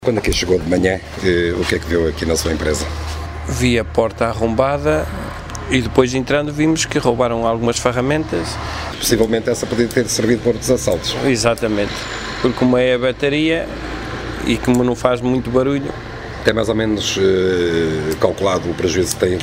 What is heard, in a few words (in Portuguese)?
som assalto